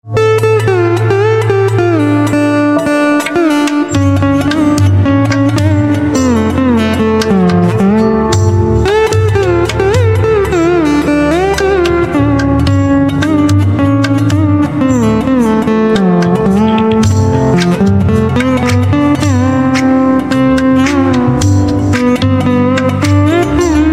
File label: Veena Instrumental Ringtone